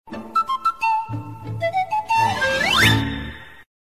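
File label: flute sms ringtone; fleyta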